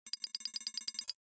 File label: futuristic typing